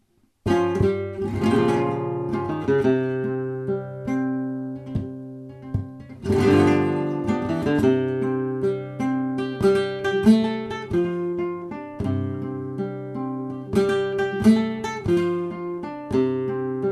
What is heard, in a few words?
cabales, flamenco